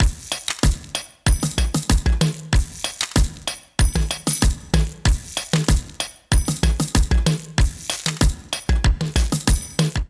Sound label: Toy Club Kit 126 Bpm, drums; MIDI Clips; Ableton; Factory Packs; Vibrations; ModeAudio